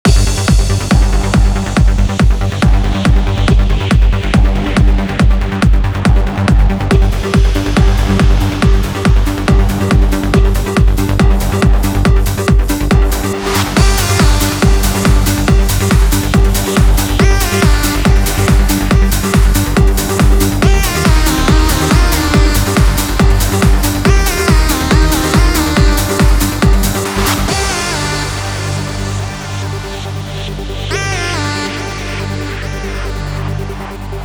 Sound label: 140BPM Complete Mix, Gminor; Vengeance Samples; Space Of Time Kit